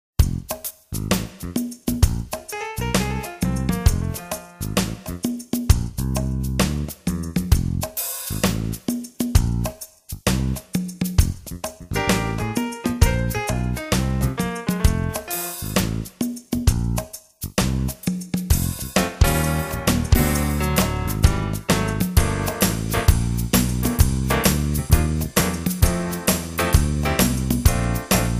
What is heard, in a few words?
something is happening instrumental